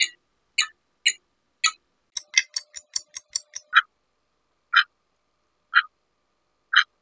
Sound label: CLOCKS; EARTH